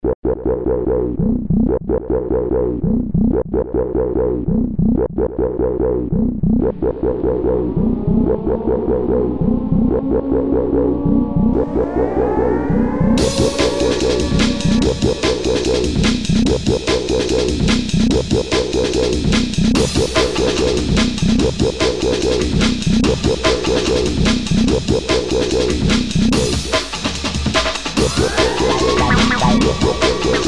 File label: Horror mines